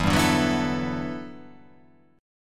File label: E-Minor 11th, Em11 chord; strummed; JGuitar